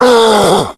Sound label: pain tank